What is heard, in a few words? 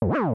stomp; koopa kid; smw